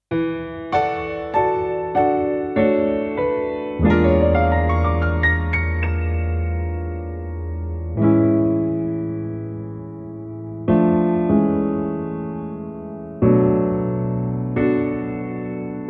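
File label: accompaniment slower end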